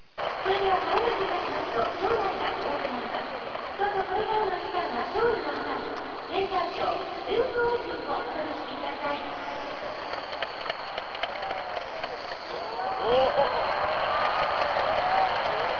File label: firework; 福岡ダイエー・ホークスVS中日ドラゴンズ; 日本シリーズ; 九州・福岡; レーザーと花火; その他の球場音